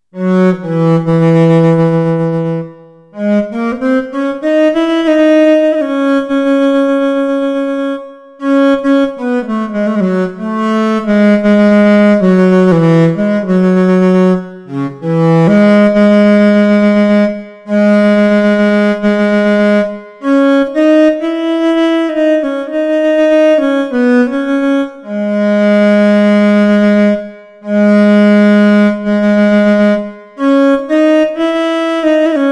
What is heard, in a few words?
Dark Cello